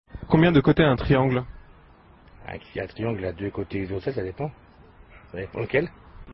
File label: Triangle; msn sounds